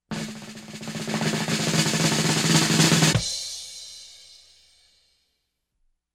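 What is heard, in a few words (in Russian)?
cirkovaja barabannaja drob s dyshh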